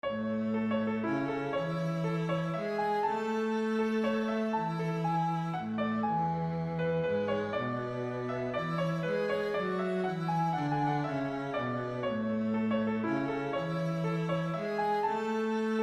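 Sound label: waltz clog melody with viola cello 120bpm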